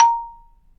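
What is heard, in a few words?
MARIMBA C6; ILIO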